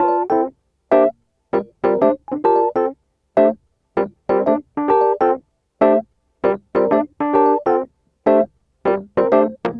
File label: Smooth Groove Rhodes, 70s Analog Funk; Sonic Foundry (Sony Creative Software); What it Is!